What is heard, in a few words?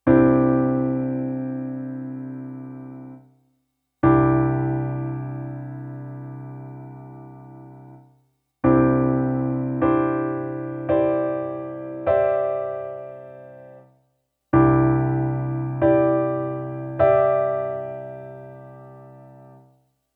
Add2